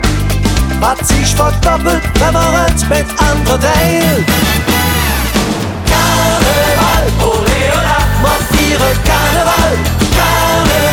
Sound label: Carneval